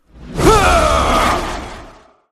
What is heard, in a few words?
skill effect